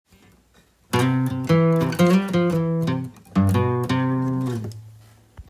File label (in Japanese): ドレミ